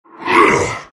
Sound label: grunt; terrorblade